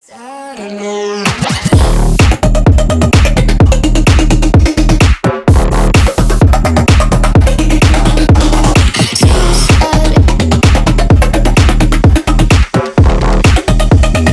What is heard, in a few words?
remix